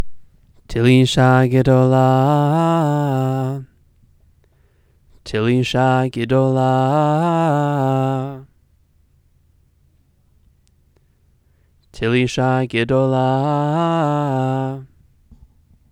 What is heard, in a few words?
telisha gedola; Trope Families; trope exercises